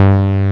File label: MGV BASS; Pro-Rec - Synthsonic; JV ANALOG